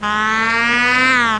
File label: BUZZ BY